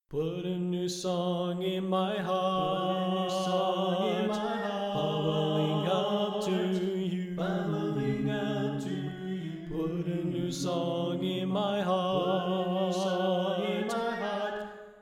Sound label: Tenor Left